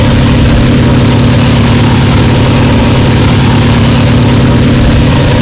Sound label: an2 rear, engines; War Thunder